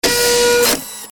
servo action style

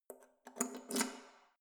letterbox metal insert key

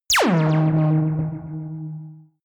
warp jump

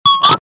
guitar cut

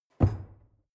drawer narrow close lock

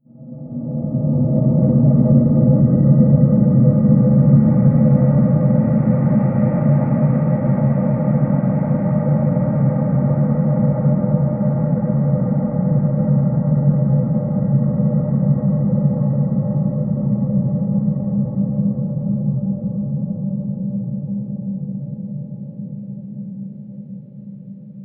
drone noise high

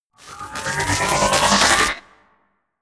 wind up

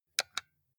console controller xbox xyab press

